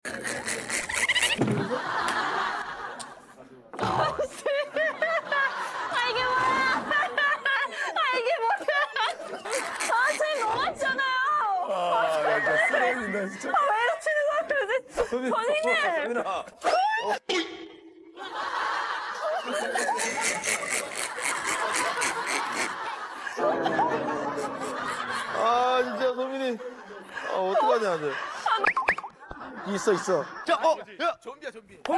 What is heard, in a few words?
vocals